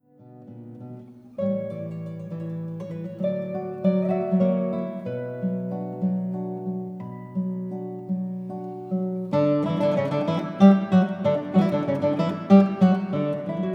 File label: sarabanda, guitar